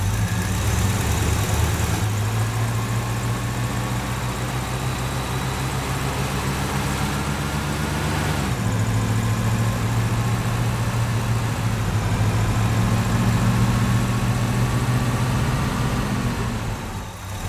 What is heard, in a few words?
vehicle classic drive